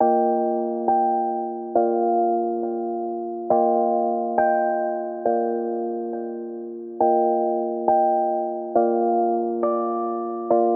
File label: Sleepy Lullaby